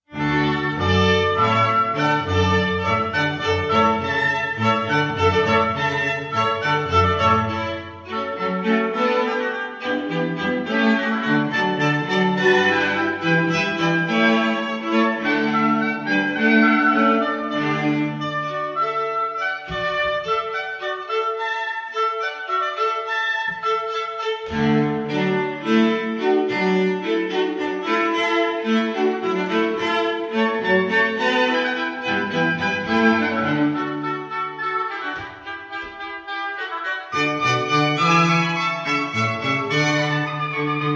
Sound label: Hornpipe